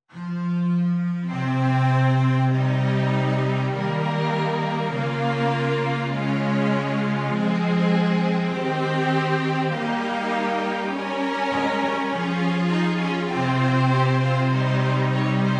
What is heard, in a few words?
canon in a minor, flexible mixed ensemble 4 players